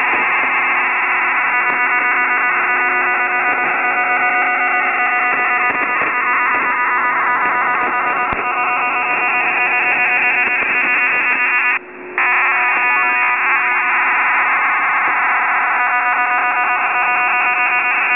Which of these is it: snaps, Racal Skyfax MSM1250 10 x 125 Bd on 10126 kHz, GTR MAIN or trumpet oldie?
Racal Skyfax MSM1250 10 x 125 Bd on 10126 kHz